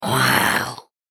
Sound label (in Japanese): クリーチャー ステレオ